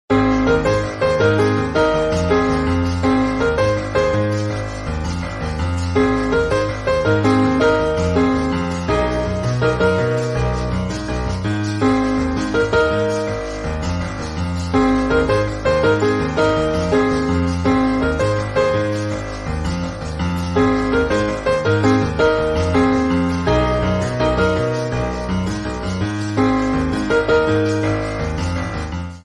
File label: christmas ringtone; charlie brown